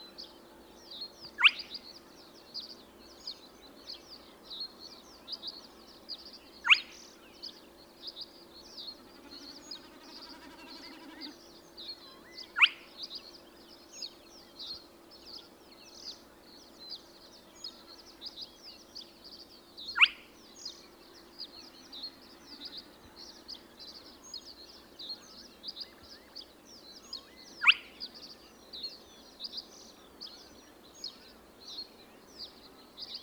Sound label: Spotted Crake Porzana porzana, spotted crake call